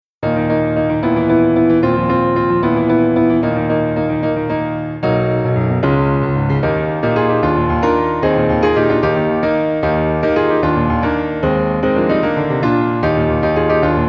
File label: bgm, piano; Famicom Wars